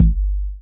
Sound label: BD; Best Service; Dance Mega Drums